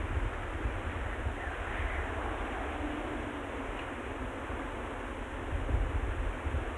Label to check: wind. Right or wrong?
Right